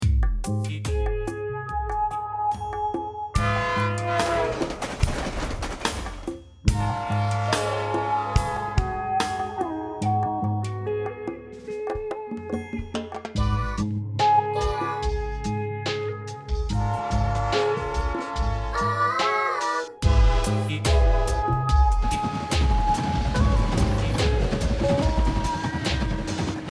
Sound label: slo funk train